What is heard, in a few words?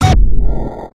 Transition